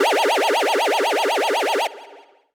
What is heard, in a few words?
BEAM